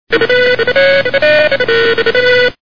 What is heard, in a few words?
phone; Our Man Flint